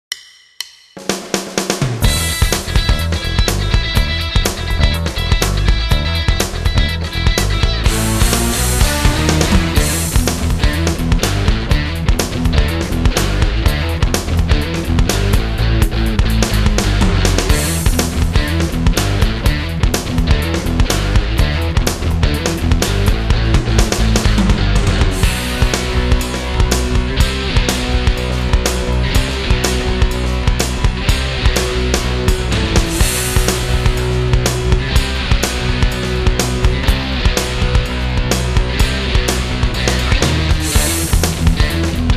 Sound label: Backing; Masterclass II